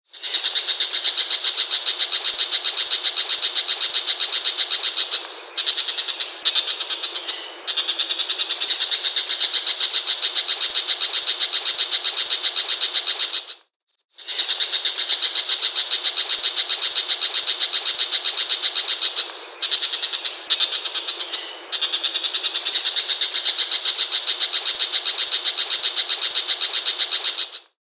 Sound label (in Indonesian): suara masteran cendet macan